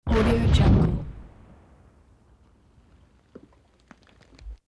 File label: cannon mortar shot; hollywoodedge